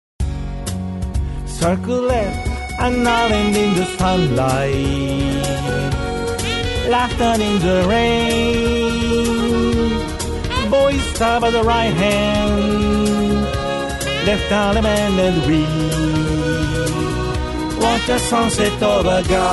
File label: walking on the waves vocal with harmony